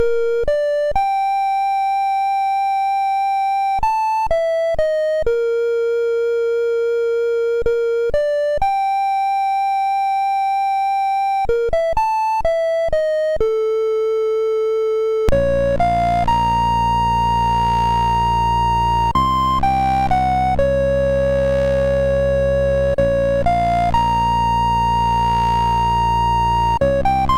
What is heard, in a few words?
8-bit sid MOS6581R2; Civilization